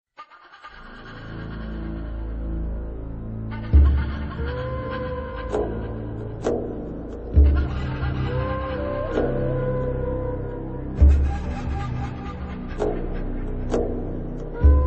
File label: Jungle